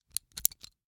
Rachet, vanadium steel, 8in, standard, locking, clicking, mechanical, new, socket release, clear; Eiravaein Works; Vaeyan IV